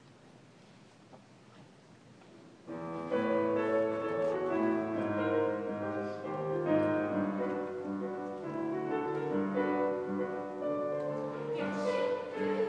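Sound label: jingle bells with a swing from youth chorus; gso; jubilate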